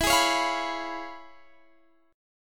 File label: C sharp 7th E; C#7/E; strummed